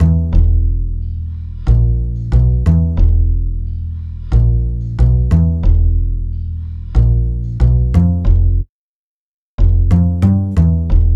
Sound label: Bass, Hip-Hop